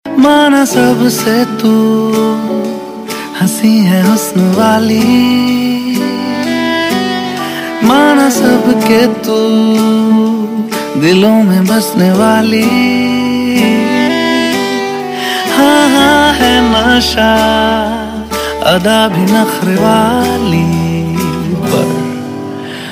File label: ringtone; Bollywood